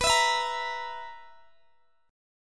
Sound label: B Major 7th Suspended 4th B down Guitar Open D